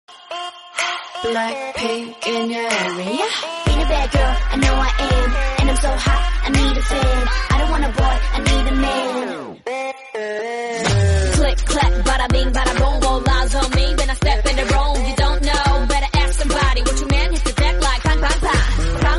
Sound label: Korean Rap